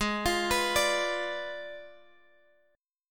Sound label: Ab Diminished 7th Ab Guitar DADGAD